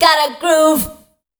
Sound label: PHRASE, Voice Spectral